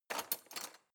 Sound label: knife wood drawer grab hard